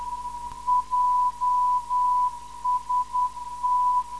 403kHz, NDB; JST

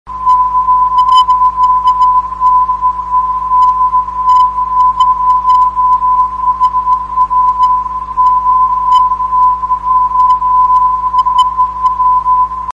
472.5khz; 472khz web swl; dk1hw

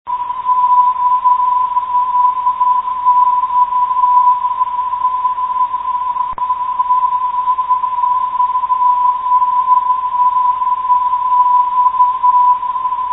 bhx 406khz, ndb

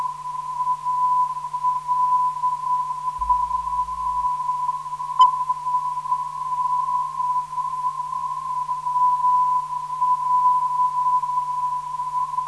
443kHz, ndb

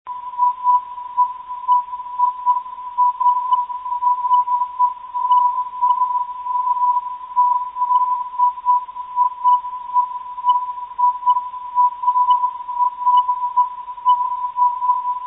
iee 413khz, ndb

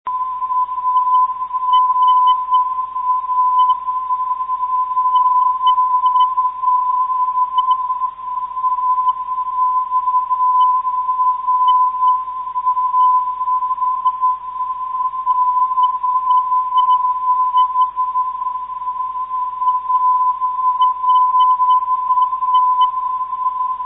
393kHz, ndb; ara

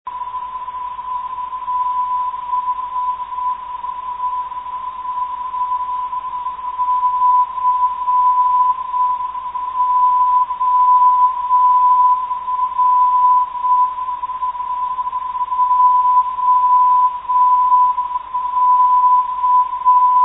505.06khz; 505khz; ok0emw

